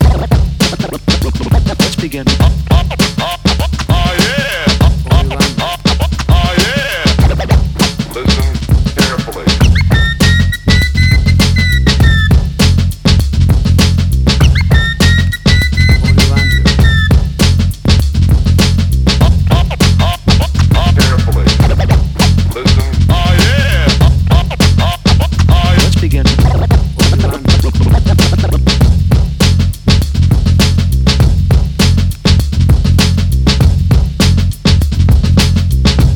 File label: 90s Hip Hop